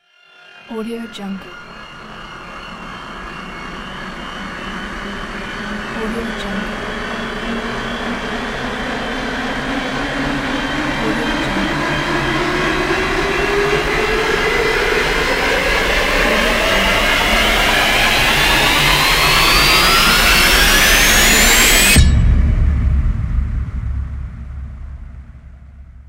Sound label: real suspense reverse